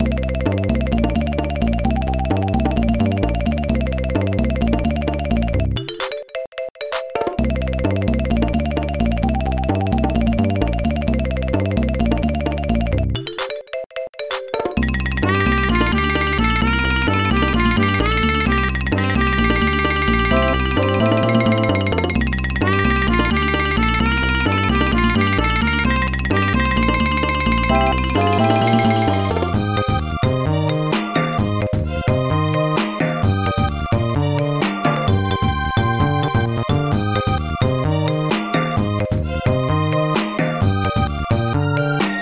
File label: Whimsical; Voidspire Tactics